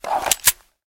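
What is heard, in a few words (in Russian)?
мощная перезарядка лазерного оружия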